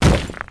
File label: stone break